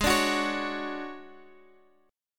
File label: D Major 7th Flat 5th, A flat